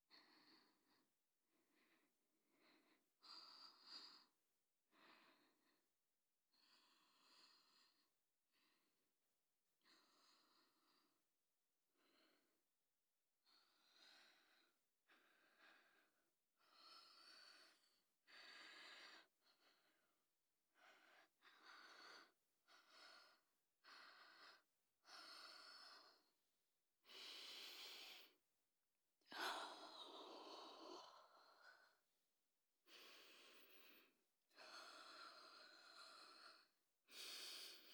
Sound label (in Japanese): フェラと手コキによる愛撫と耳舐め, ASMR